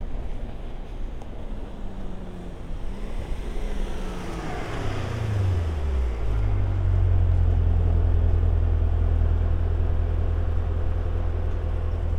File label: Arrival Slow Stereo, Jaguar XE SV Project 8; Pursuit Sound